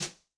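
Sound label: Brush Tap, Yamaha DTXpress-II snare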